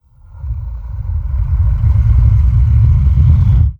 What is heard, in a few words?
trex breath big